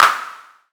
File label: SERGE Clap, drums; Polyend; Stazma & Concrete Collage; Concrete Collage Drum Kit; Concrete-Serge Drums